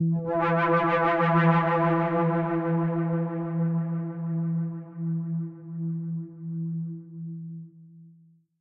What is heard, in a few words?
Synth Loop; Fortnite Save the World; macos; windows